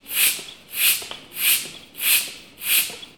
floor pump

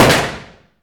smith wesson shot

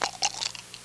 dice shake

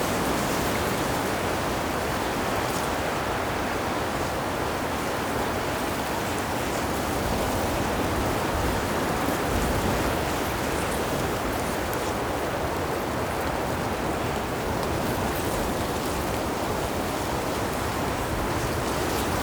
sea waves wind

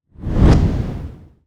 fire swoosh

